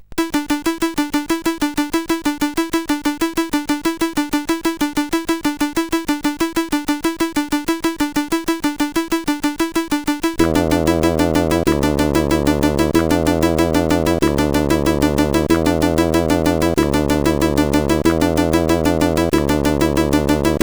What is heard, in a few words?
New Intro, sid CSG8580R5